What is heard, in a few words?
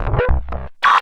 Blurp Hi, Hard & Loud Techno; USB Soundscan vol.01; AKAI